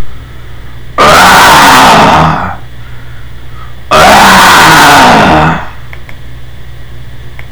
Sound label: Roar